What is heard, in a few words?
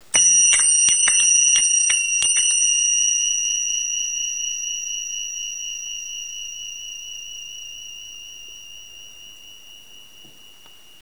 pro zills plain, Belly Dance Zills Plain Professional